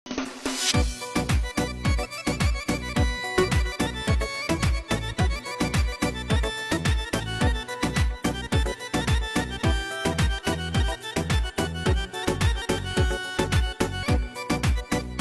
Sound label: piano version